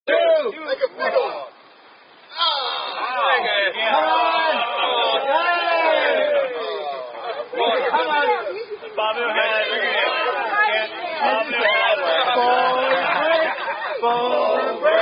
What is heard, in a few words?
Ball Break Down-Down Song; Ball Breaker Circle At Eurohash2011; Great Hash Circle